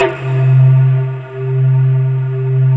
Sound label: MALLET C3; MALLETTE